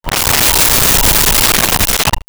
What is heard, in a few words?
Shuttle Fly By, Science Fiction